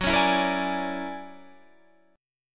Fsharp 7th Flat 13th, guitar chord

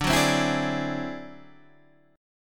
D 9th Flat 5th D Guitar Half Step Down

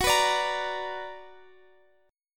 B Minor Major 7th Gb, chord

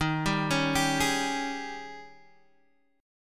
D sharp 11th D sharp, Guitar, Open G

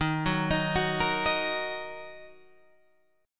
D sharp Minor D sharp, Guitar Open G; 13,11,13,11,11,13; chordshape

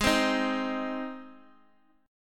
Ab Major Ab down

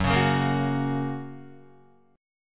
F sharp Major F sharp Guitar; strummed; JGuitar

